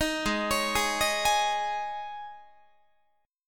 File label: G sharp Suspended 4th G sharp, Guitar; arpeggiated